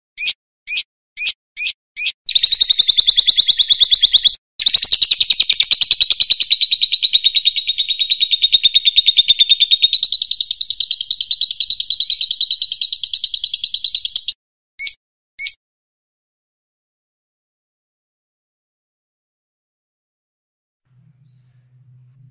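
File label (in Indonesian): kombinasi lb konin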